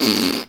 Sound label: fart sound